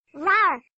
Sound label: RAWR